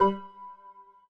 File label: chord (G)